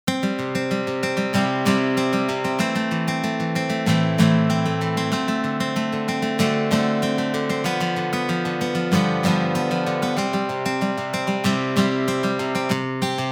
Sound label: strum gs2; aas